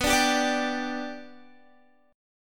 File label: B Minor Sharp 5th, Guitar; strummed